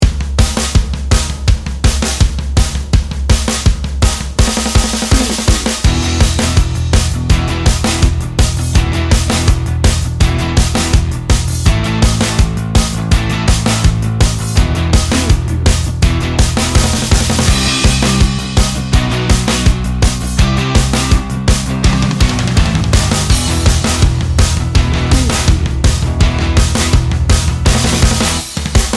bed

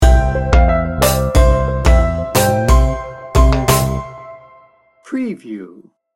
child like and upbeat sting bumper

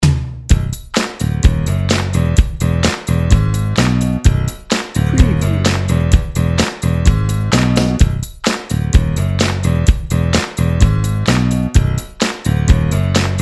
happy hour no guitar no congas

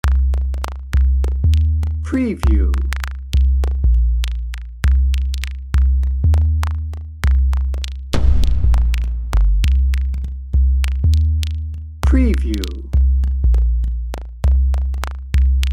mardi gras funk dnb

